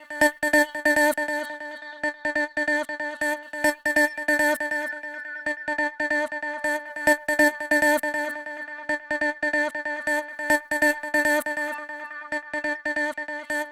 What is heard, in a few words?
Kit 140BPM Vocal fx Loop